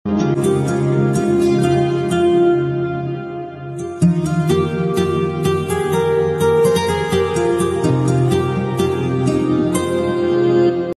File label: sad call ringtone new mobile call ringtone music ringtone